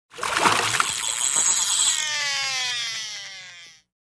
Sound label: Fish Reel Strike, fishing